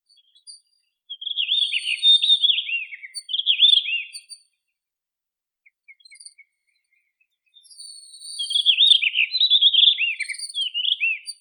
Monticola solitarius song; passeriformes; muscicapidae

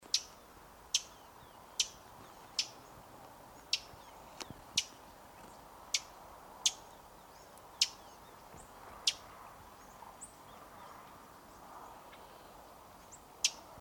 Passerella unalaschcensis call; passeriformes; passerellidae

